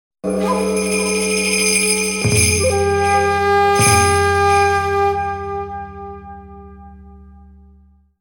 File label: death jingle dead; Kengo 3